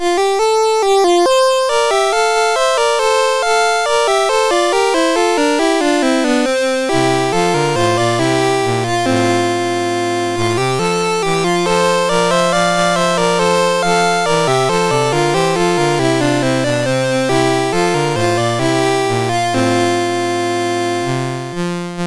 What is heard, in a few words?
MOS6581; MOS6581R3